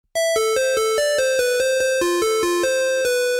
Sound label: benzina sms